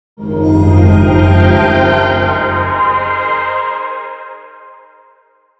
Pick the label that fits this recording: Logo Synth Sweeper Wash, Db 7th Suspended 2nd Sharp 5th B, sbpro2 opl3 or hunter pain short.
Logo Synth Sweeper Wash